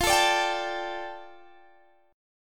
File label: F 6th Add 9th F down Guitar DADGAD, chord